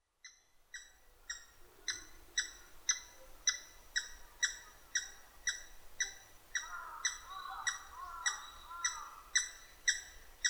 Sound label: yamagera